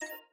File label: energy